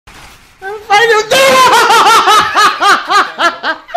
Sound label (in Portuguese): ai meu deus hahaha